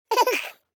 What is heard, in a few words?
BABIES LAUGH Boy 12 months Short; Baby Boom